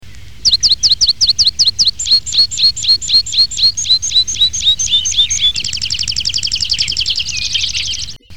pipit farlouse

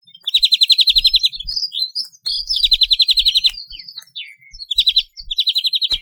verdier, Musique

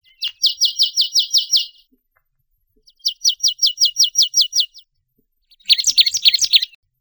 nonnette, Musique

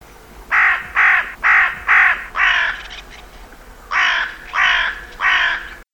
corneille

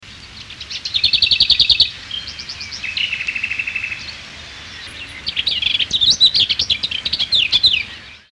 fauvette

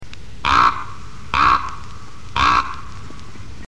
corbeau

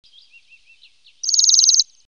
jaseur, Musique

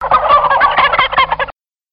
dindon

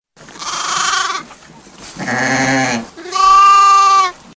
mouton, Musique

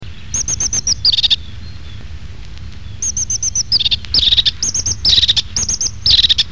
huppée

